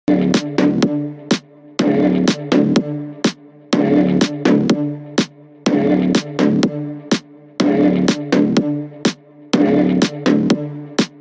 musique

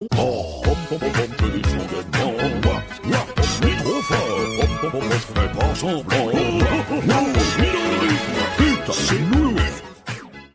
nounours disco

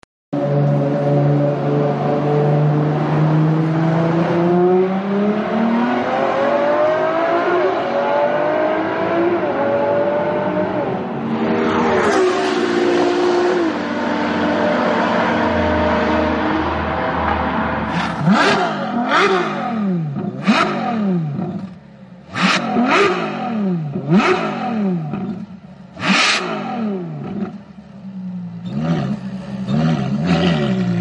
la ferrari

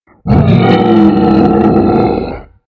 mon cri modifie

